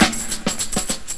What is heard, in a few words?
Tunnel Classic; Urban Shakedown